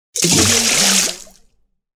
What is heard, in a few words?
splash; wistansound